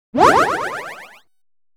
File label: SE MAP ROAD COUNTUP; maimai